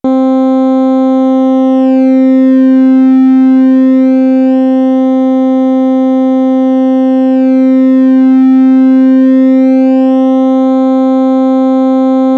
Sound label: Sinus Bullfrog